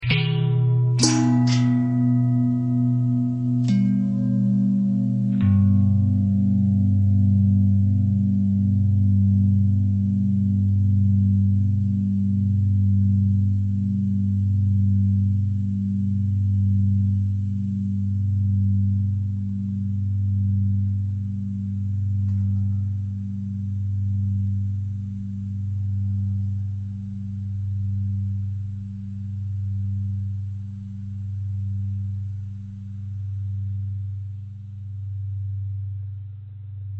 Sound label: long bell long bell threads, bells